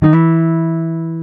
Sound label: Jazz Guitar